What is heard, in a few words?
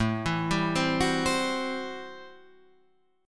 A Minor 13th A Guitar Half Step Down